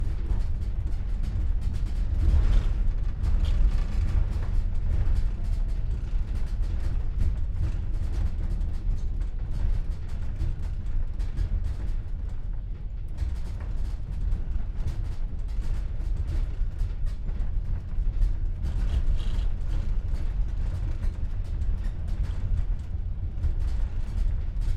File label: Train Siemens Desiro Ambience Interior Corridor Passage Active Constant Speed Rattle Squeak; FLYSOUND